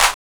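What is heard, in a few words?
clap; E-Lab; X Static Goldmine 1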